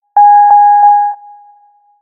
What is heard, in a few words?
Warning